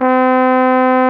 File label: Tuba A#1